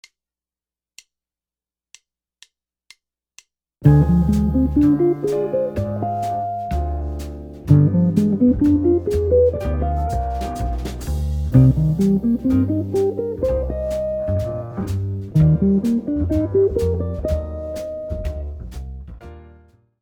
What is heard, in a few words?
minor ii V I arpeggios, guitar